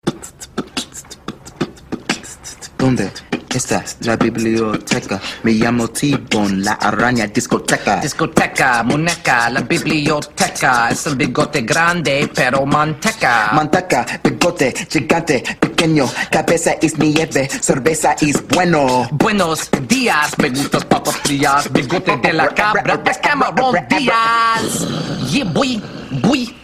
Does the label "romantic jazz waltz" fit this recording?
No